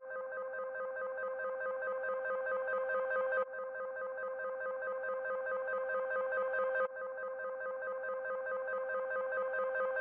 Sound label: 140 BPM C Min Arp; Trap Melody Loops; Loop Stems; Cymatics; Nightmares; Spaced Out